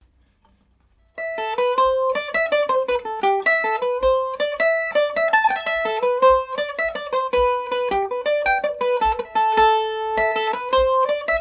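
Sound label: A dorian, Irish mandolin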